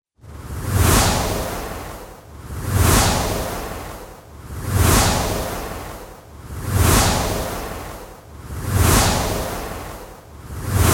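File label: countdown as if swept away by a whirlwind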